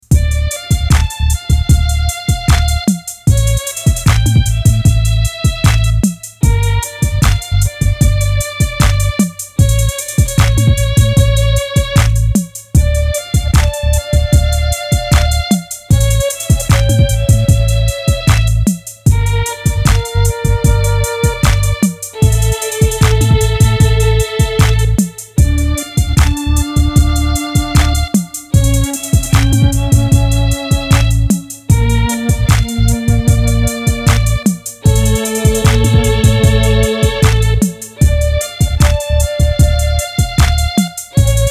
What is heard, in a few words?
Mellotron 3 Violins Flute; Mellotron Micro